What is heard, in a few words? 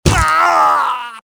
die, pain death, male; Soldier Of Fortune II Double Helix; XBOX